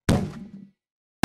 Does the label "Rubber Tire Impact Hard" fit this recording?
Yes